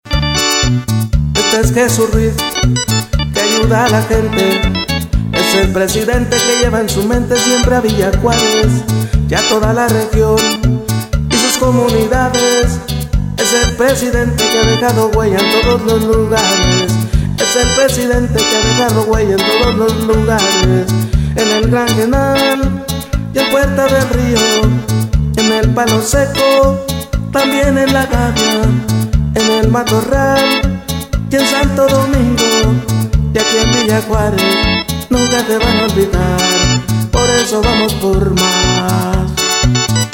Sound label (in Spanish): Cumbia, tecladista